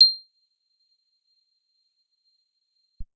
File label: guitar acoustic, acoustic guitar nylon